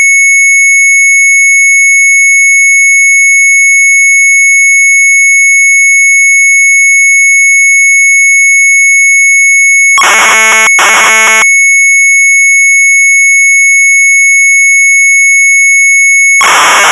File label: MZ800 Tape; Sharp